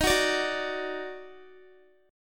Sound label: D sharp Minor Major 7th, Guitar; strummed